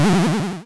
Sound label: Hop Spider Jump; enemies; unsighted